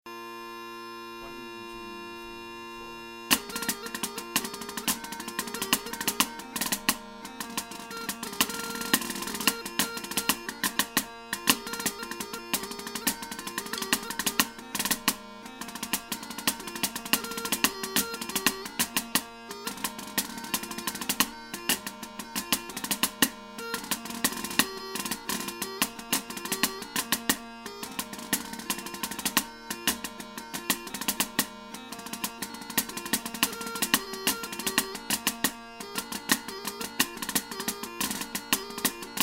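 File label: Caberfeidh Jig Advanced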